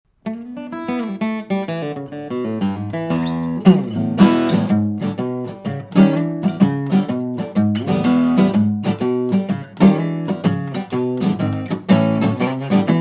Guitar; معرفی میکروفون شات گان AZDEN SGM-250; SGM-250 Guitar Demo Phantom Power

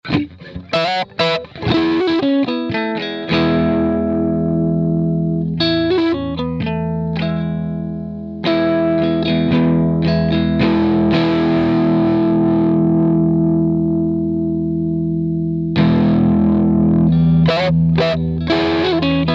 Plexiglas 100W with 4x12 Green 25W and Cond 414; headrush gigboard

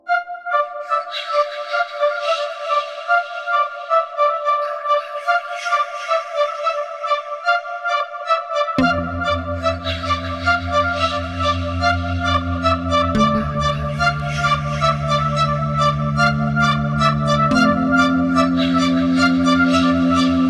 UNO Synth; ik multimedia uno synth; سینتی سایزر و سکوئنسر iK Multimedia Uno Synth; Solitaire Demo

